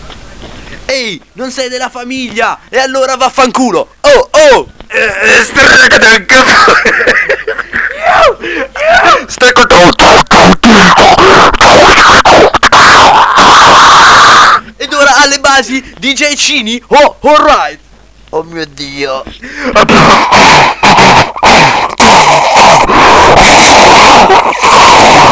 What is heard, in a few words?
rap